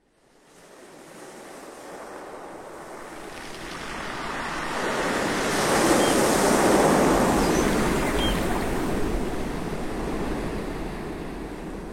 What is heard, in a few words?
waves